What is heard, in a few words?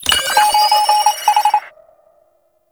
Reinforcement Notifier; Fallen From Heaven